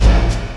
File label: BD, Industrial; BD KIT; Industrial Loops